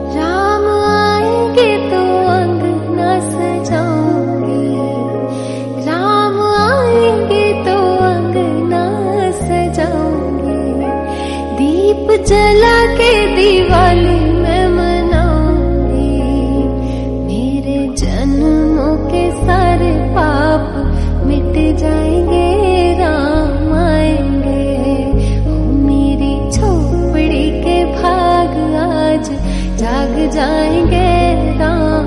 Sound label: RINGTONE FEMALE VERSION